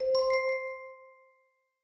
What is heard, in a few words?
Find Secret